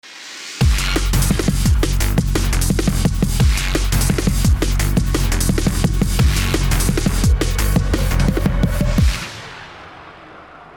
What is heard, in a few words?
round ten sec count, music